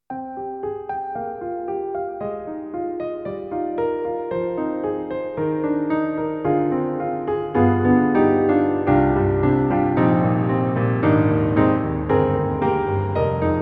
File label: Contemplate; Roland; XV-5050